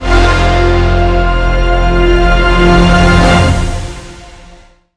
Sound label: Starting Fanfars; Millionaire Soundtrack; Indian Soundtrack [2011 - now]